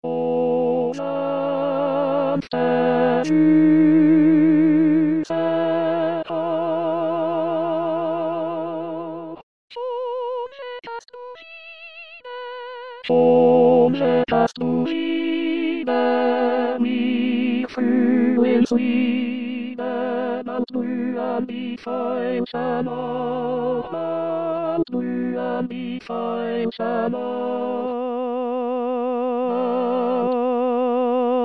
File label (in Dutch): mp3 files tenoren